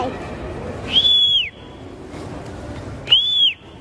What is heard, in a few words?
whistle